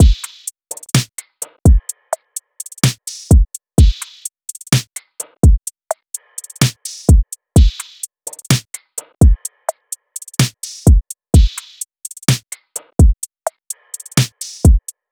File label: Drum Loop 127 BPM; Matriarch; Cymatics; Vibes II Drum Loop Collection